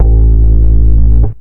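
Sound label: electric bass; SoundFactory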